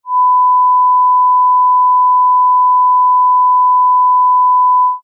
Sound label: 1kHz 0dB; LG VS750 Fathum